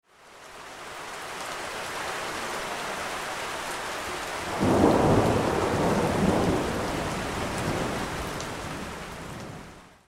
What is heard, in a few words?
sound rain